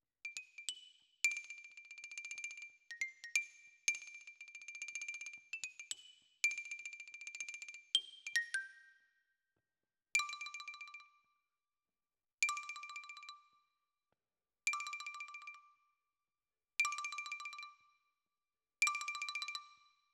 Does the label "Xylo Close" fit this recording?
Yes